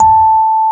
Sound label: A 4, Electric Pianos; Wizoo